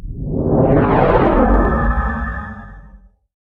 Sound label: fade, ships combat